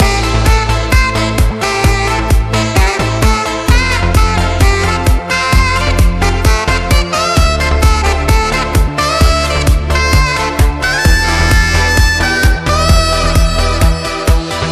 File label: sax